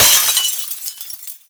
break glass bottle large; physics; breaking

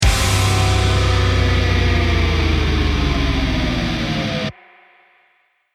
lost round, music

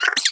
vox twitch, creatures, snark

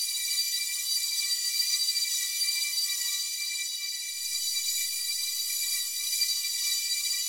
amb abstract whispy lp, ambient; Half Life Model Pack

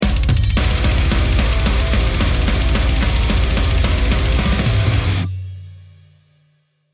round mvp anthem, music

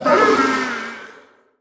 scream, zombie; creatures; trainyard

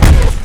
dog footstep run; Half Life 2

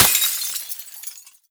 break glass bottle small; physics; breaking

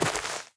step light, footsteps player grass

